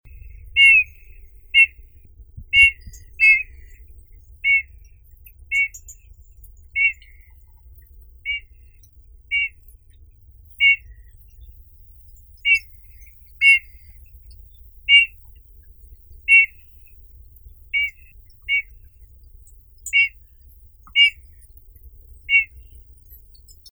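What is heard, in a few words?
grey bullfinch Pyrrhula cineracea, nature sounds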